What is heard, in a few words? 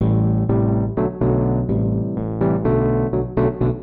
SYNTH VOCAL 125 A; Keys